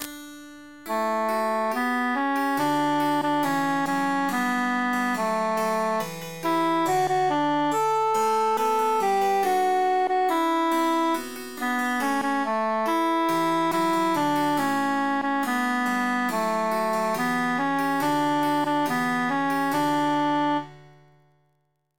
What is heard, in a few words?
altus emphasized; non nobis domine